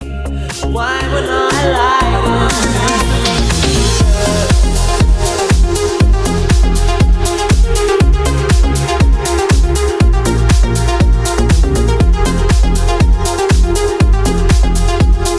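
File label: ringtone mystery relaxing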